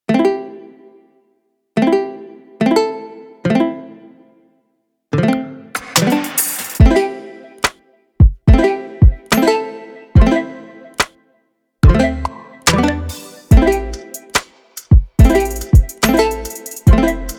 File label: Music With Bass; Tena